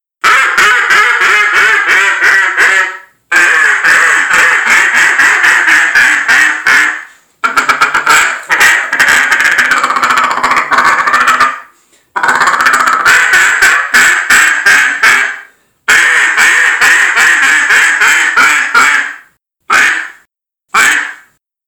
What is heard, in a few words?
Single reed